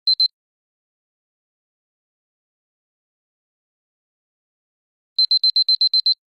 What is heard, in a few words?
Clock alert; Nokia X2-00